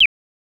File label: single Beep